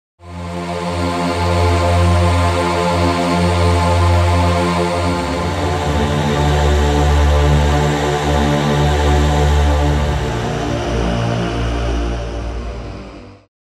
dark cantus

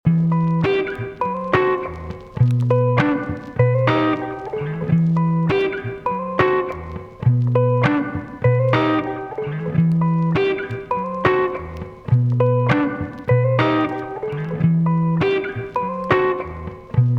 gai full melody bm 99bpm

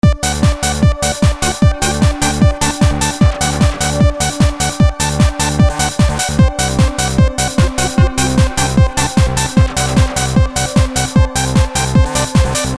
makina

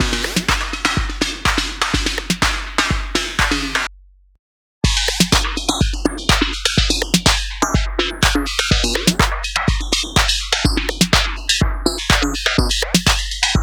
spctrl fast beat

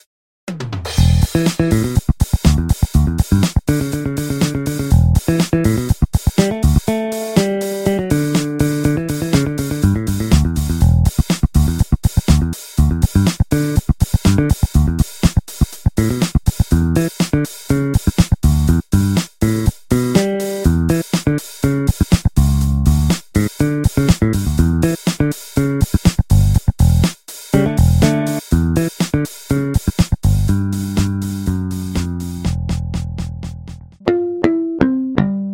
fingered electric bass

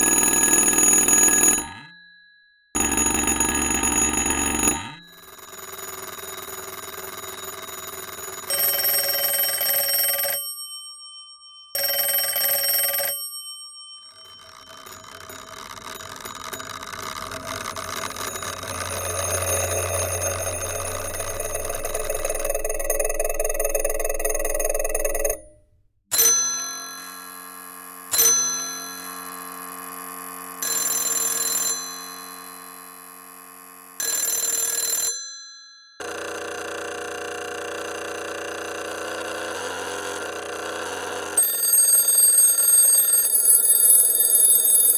vintage telephones

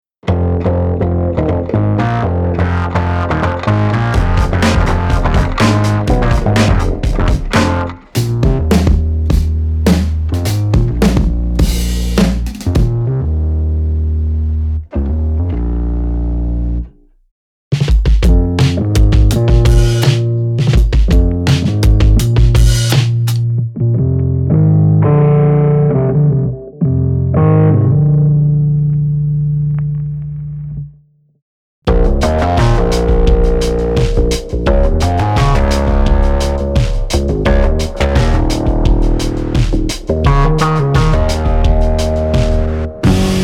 root bass keys

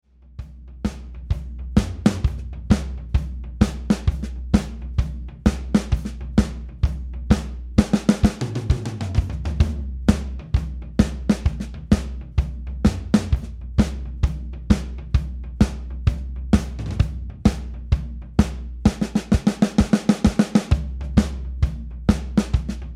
80s pop

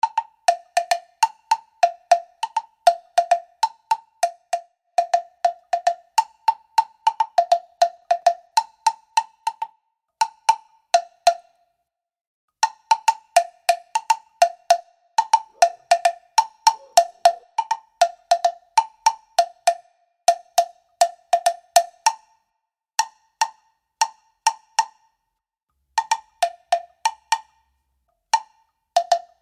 agog

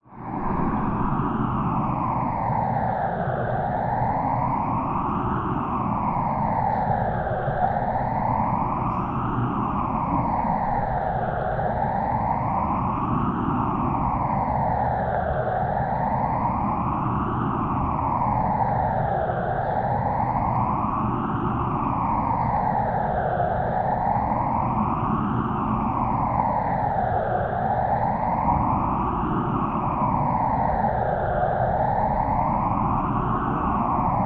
spacey siren scarey alarm aler

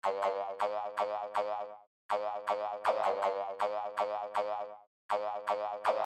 indian percussion morsing mukh

